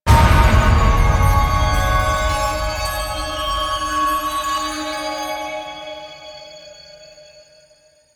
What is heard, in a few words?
Chimes; Ballas; Warframe; The New War; Throne Room